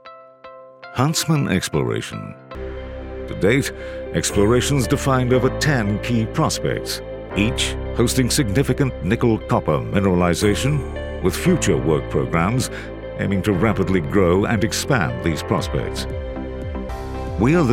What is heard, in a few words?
Huntsman Exploration